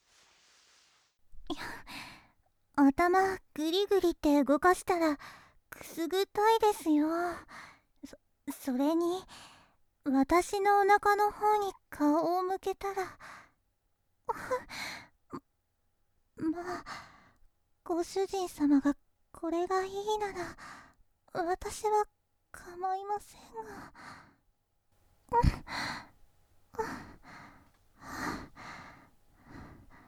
こちらのお耳も、綺麗にしていきますね(左), ASMR

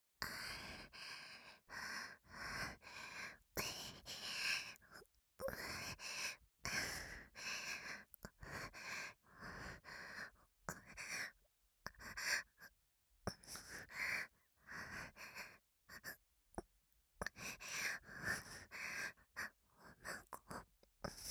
はあはあ、耳舐め 効果音なし; はあはあ、耳舐め、おちんぽ（左・効果音なし

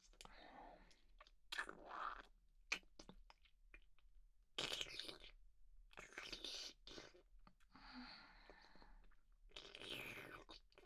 フェラ抜き, ASMR

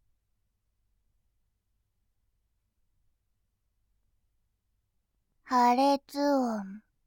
破裂音, ASMR

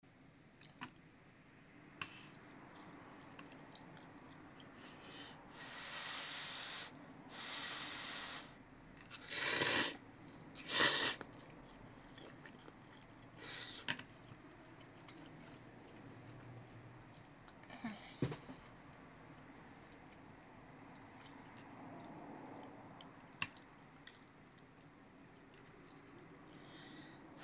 らめーん たべるだけ

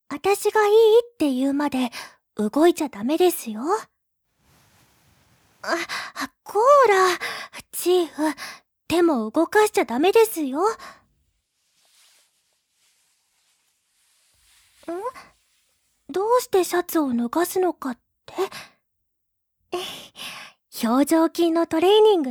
乳首舐め; さくら

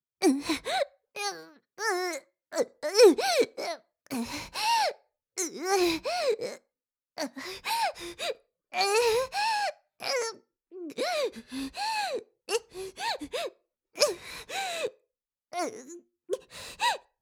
喘ぎ声

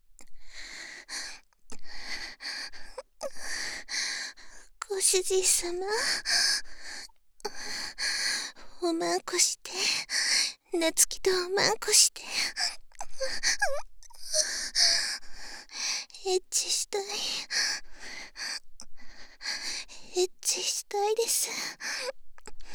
エッチしよ 喘ぎ; ハーレムオナサポ 4人のメイドがご主人様のオナニーをお手伝いするバイノーラル～文、千曜、名月、莉桜～

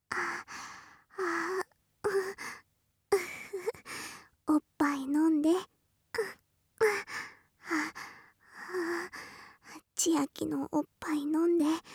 喘ぎ, ASMR

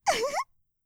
うふふ, 笑い声

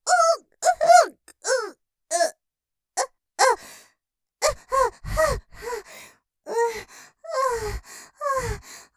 絶頂喘ぎ 仰け反るように ビクンビクン系, ASMR; ステレオ; ループで楽しむ！シェフの気まぐれ抜き抜きコース

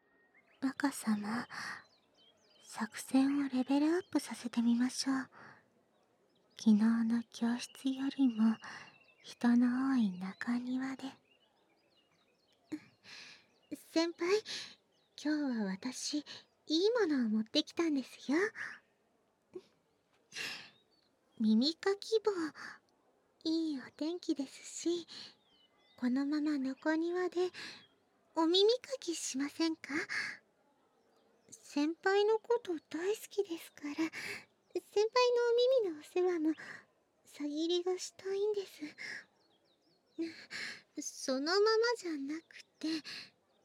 学校でお耳かき

